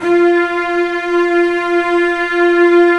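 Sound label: cello F4; Digital Sound Factory Virtuoso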